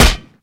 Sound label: hit